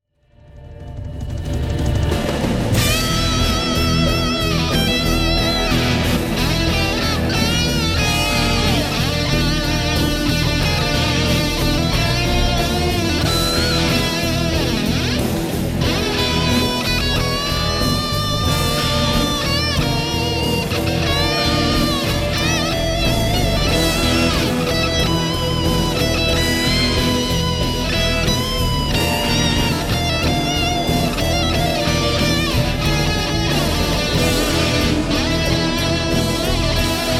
Solo Boss CS2; HotWax

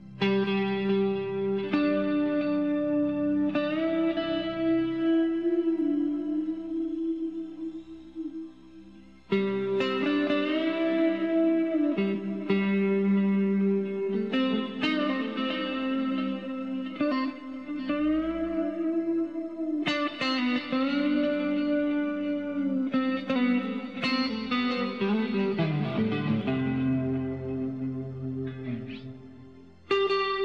isolated guitar solo; Earls Court 1994

